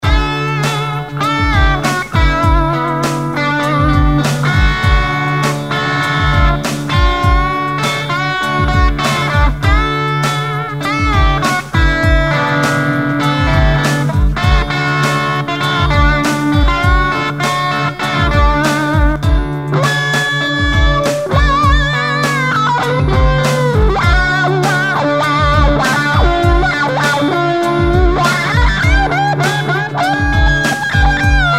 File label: wah-wah